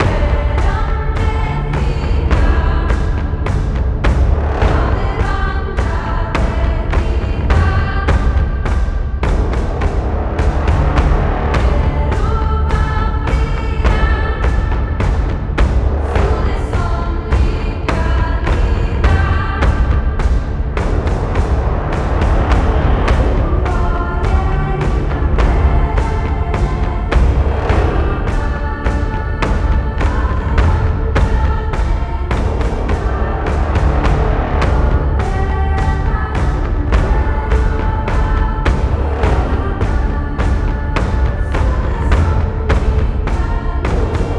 Battle Music Intensity 3; bramble the mountain king; ps4 ps5 switch windows xbox one xbox series xs; Skogsra

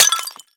pot smash start; Scrat

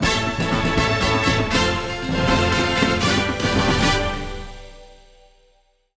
Horse Racing - Token Completed; mario sports superstars 2017 3ds gamerip

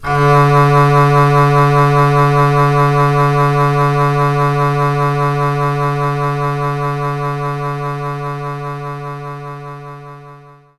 Double Bass D3; knights try

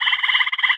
frog

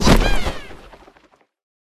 Balloon Paratroopers; Warmonger

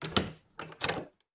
Door Open

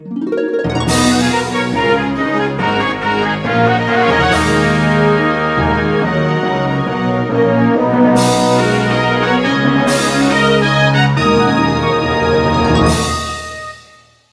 Jingle Stage Start; Gensou Shoujo Wars: Dream of the Stray Dreamer

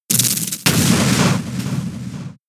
Powder; attack moves; Pokemon; gen 7; SUMO; USUM; Powder part 2

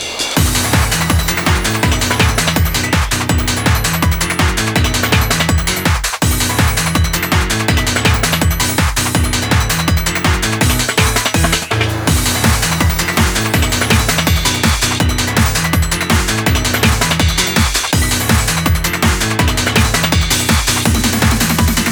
login bgm; Beatstream Animtribe 2015; e-Amusement pass

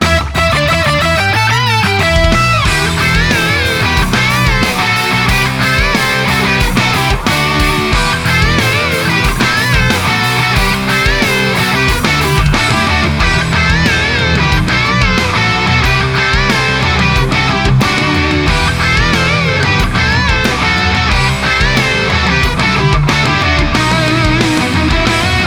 Rock; puyo puyo champions 2019

